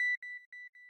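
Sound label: square lead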